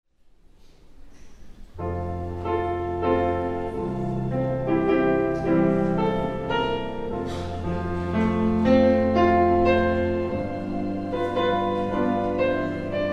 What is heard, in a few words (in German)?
Vorspiel